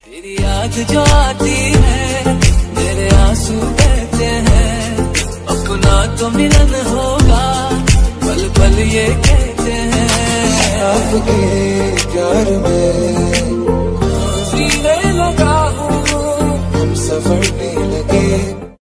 hindi ringtone

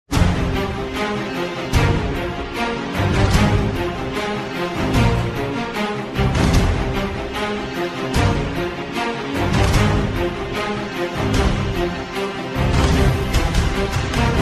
epic violin music ringtone

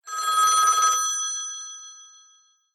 classic telephone ringtone

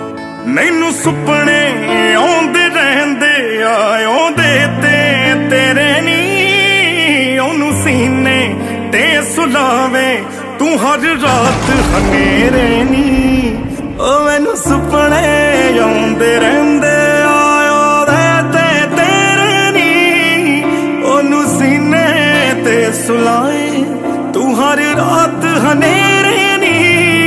most emotional punjabi ringtone

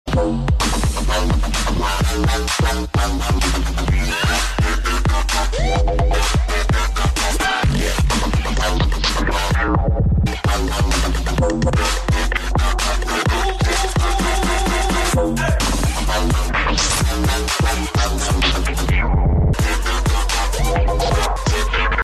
robot hard electro ringtone

